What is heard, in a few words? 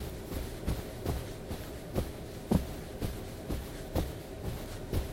Walking